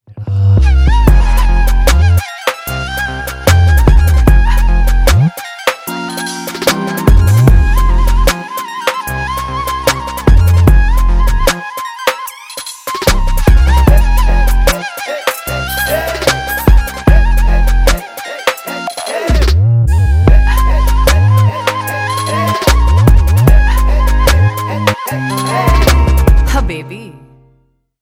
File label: baby flute ringtone; android